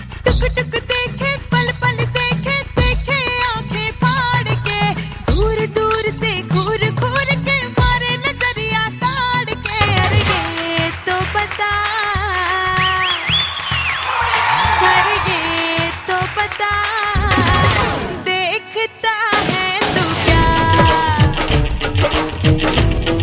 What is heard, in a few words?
hindi song; krazzy 4